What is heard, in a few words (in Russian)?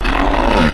udar